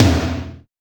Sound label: TOM, Drums; Best Service; Real Mega Drums VOL-1